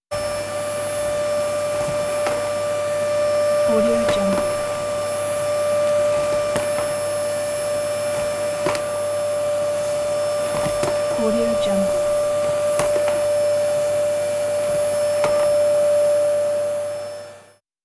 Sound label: vacuum cleaning